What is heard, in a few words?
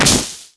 Pine Tree Slam; XBOX; Amped 2